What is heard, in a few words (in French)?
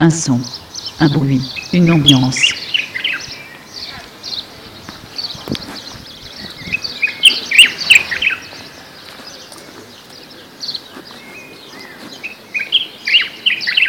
Un son un bruit une ambiance